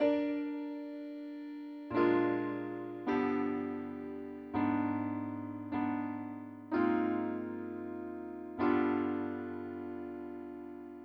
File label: piano